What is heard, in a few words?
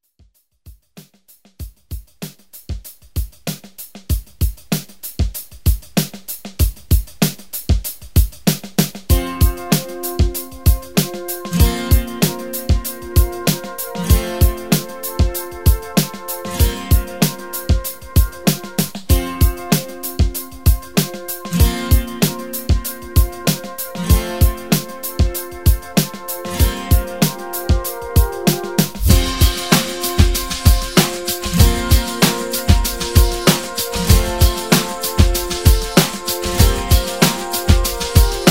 90s Pop; Redrum